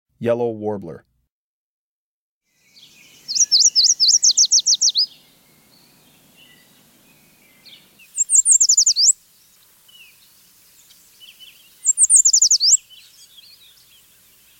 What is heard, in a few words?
Yellow Warbler; Breeding Birds of the Chicago Region